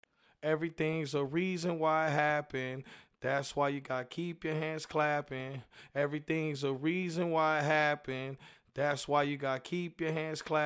drum n bass 172 Dm, Acapellas

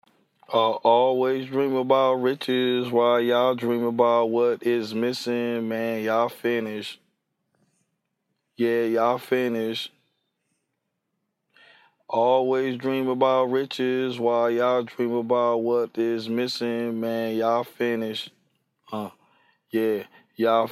hip hop 84 Asharp; acapella; Dreaming About Riches

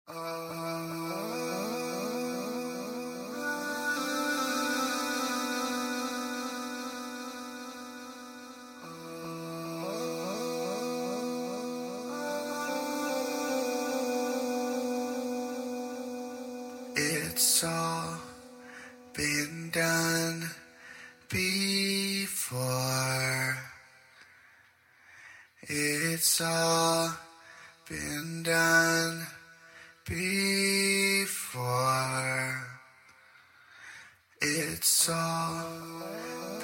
electronic, 110, Em, acapella

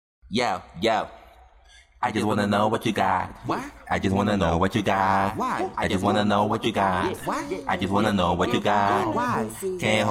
hip hop, 127, Abm, acapellas

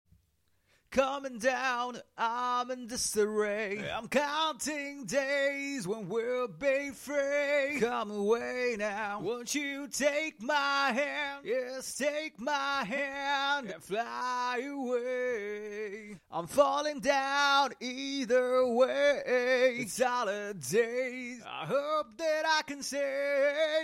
Rock 120 Cm

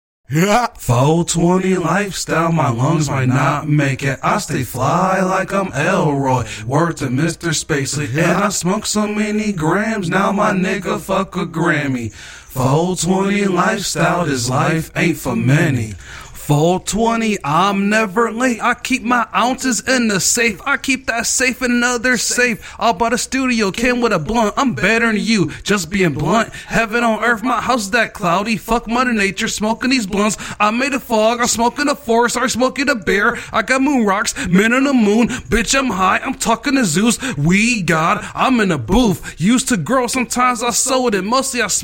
rap sing 120 BbM

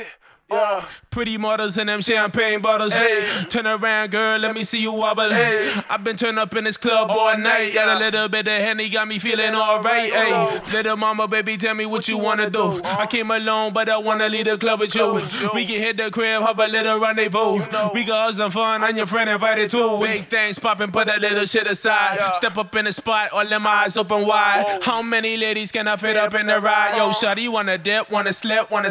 HH 100

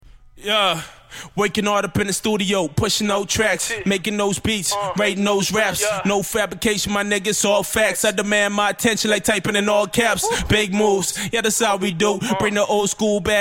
hip hop 109 GM

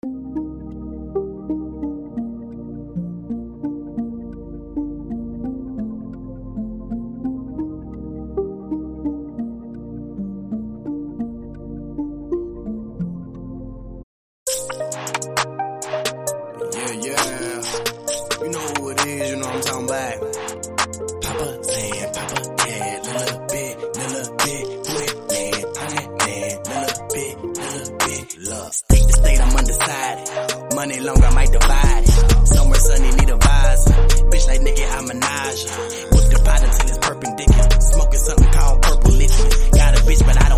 Remix

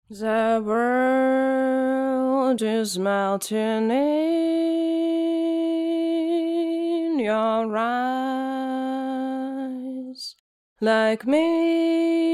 electronic, 136, C, Acapellas

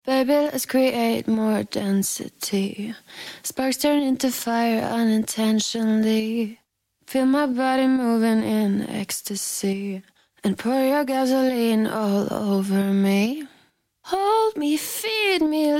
edm, 136, Gm, Acapellas

